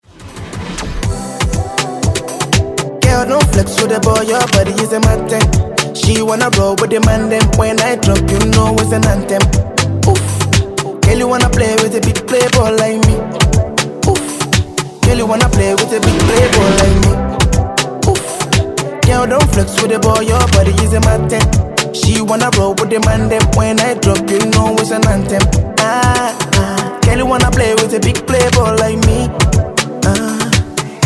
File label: Afro Moombah; Redrum